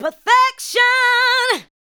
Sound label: ADLIBS